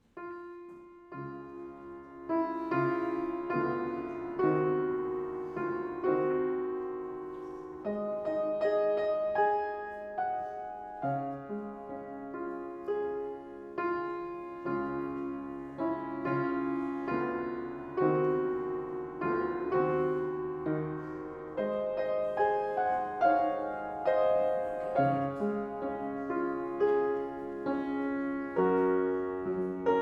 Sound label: piano